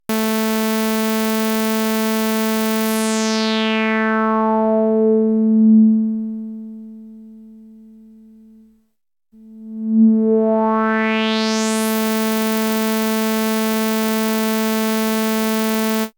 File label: waveforms sawtooth lowpass Resonance